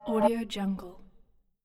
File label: short transition